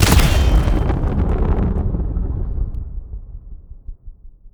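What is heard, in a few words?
wheel cannon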